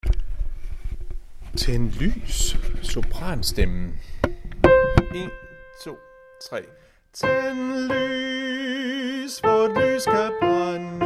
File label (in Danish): sopran; Tænd lys; harlev koret